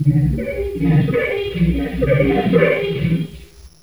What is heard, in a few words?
Vox FX